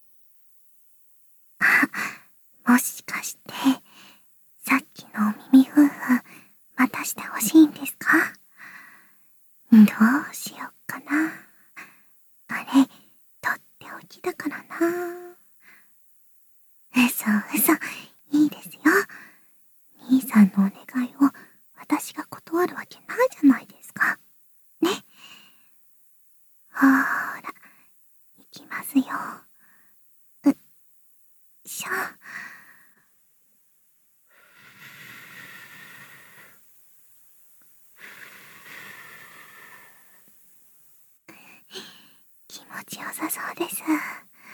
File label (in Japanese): みみふーふー（バイノーラル編集）; ミミカノ vol4 寧々; 美少女耳かき添い寝シリーズ・スタジオ収録; 効果音あり